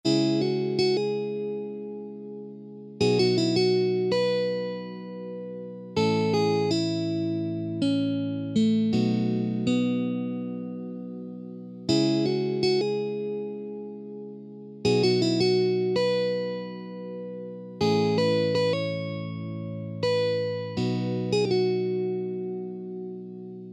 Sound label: Intro Slow Tempo, guitar